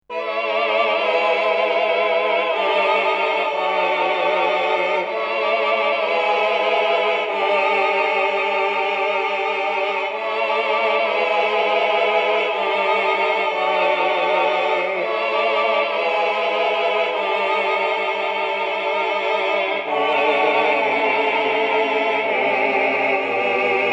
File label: Male Choir Tape; dexibell